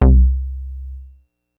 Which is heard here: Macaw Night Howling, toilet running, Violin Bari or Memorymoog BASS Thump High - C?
Memorymoog BASS Thump High - C